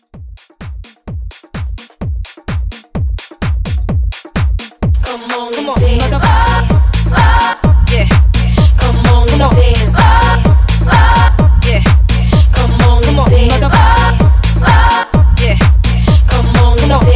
Club 128

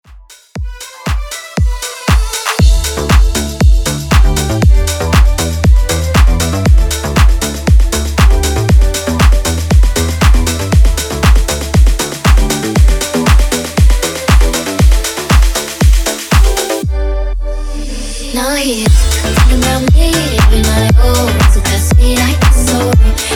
funky house breaker 118 bpm

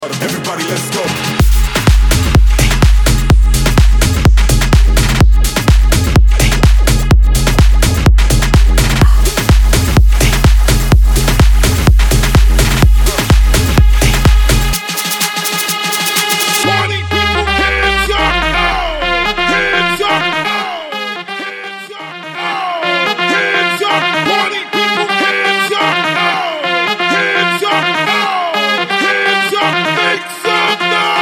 STARTER MASHUP BOOTLEG, 126BPM

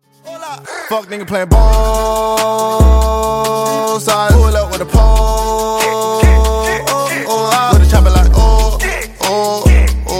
70Bpm

